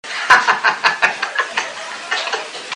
hahahaha

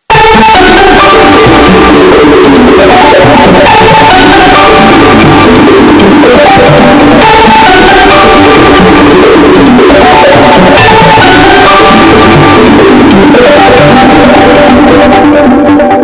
huawei zvonok bass bosted

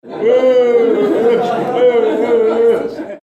uueuhuhheuhhh

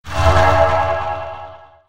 armor boost

